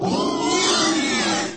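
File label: monster roar and people screaming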